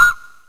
perc; FL Studio; slayerx drumkit

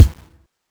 kick; goodtakimu drumkit; fl studio; drumkits

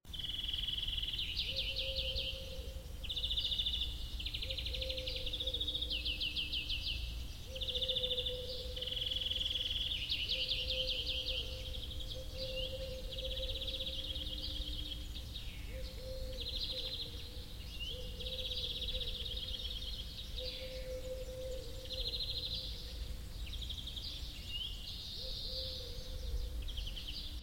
soloway; drumkits; fl studio; goodtakimu drumkit

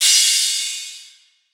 Crash; Cymbals

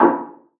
Perc

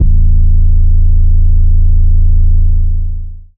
808, A; drumkits; fl studio; bvker drumkit; Born 808

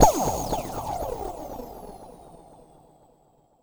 level sfx; FL Studio; slayerx drumkit

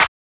clap; FL Studio; xander brown drumkit

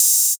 Open Hat, Cymbals

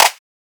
clap; FL Studio; slayerx drumkit

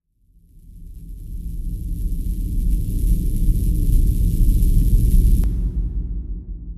fx; drumkits; rasengan; fl studio; goodtakimu drumkit

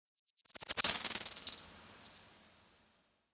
hh roll fx; drumkits; fl studio; goodtakimu drumkit

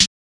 Snare; fl studio; drumkits; dstarkels plugnb

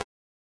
hh, Hats; drumkits; frёak; fl studio; goodtakimu drumkit

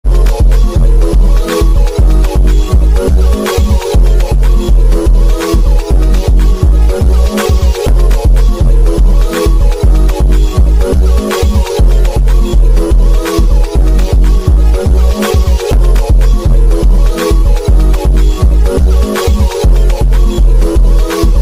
loop; FL Studio

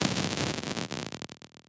oh destroy, Hats; drumkits; fl studio; goodtakimu drumkit

